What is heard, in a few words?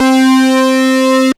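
Roland JX-03 Distortion Guitar C4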